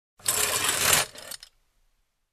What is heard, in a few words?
Metal scratching on floor